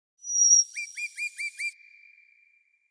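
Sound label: Nightingale Call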